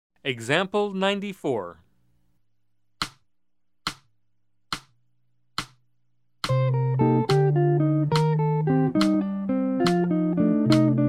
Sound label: Jazz